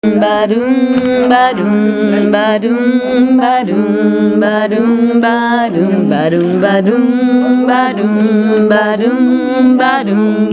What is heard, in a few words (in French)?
basse